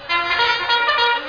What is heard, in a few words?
Trumpet